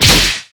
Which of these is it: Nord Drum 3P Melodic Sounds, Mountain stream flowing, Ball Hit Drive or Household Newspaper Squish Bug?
Ball Hit Drive